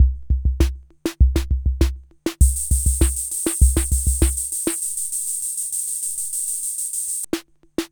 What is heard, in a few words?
R77 Rock & Roll; rhythm77